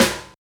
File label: Yamaha DJX 2B, SD Break